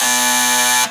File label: alarm; Ueberschall; Techno Trance Essentials